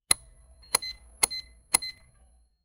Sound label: clink of tools as a; childhood